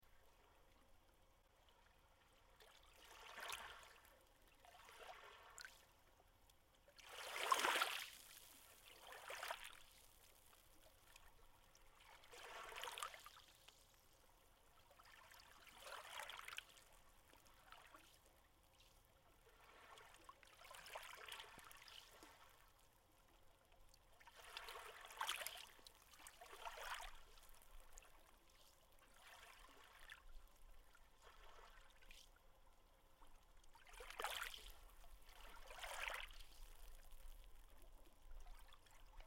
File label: archipelago